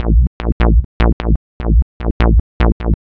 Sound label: 150 Krisp N Dry Bass, 150bpm; Combi Loops; Nu Dance Electro